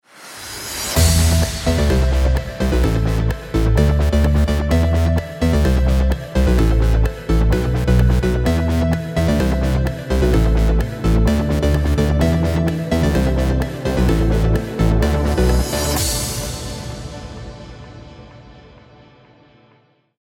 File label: start round, music